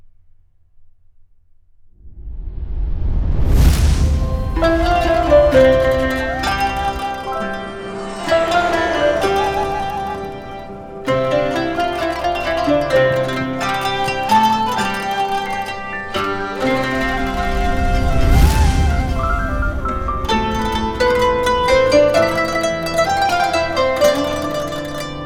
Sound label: Oriental Instruments; DCAA Oriental Instruments